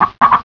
cock

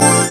SPIRIT POWER hit; spirit handler; hyun rok

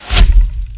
alicel attack

